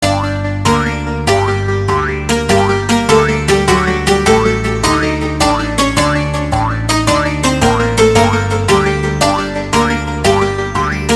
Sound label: Funk